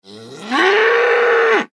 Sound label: highland cow; Highland Reels